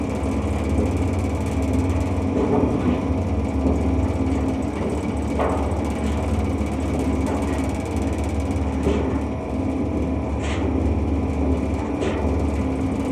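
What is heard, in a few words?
ambient hvac conduit loop; ambient air; Half Life Alyx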